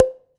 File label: Prepared Drums